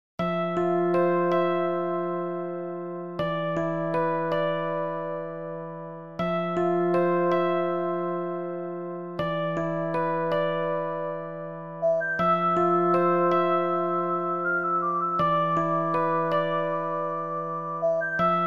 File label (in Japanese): ゲームオーバーBGM